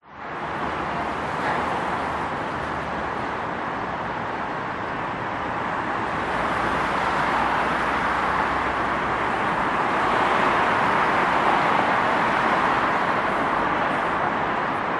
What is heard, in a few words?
vehicles traffic city motorway under footbridge darling harbour sydney australia